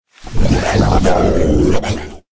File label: Enter Play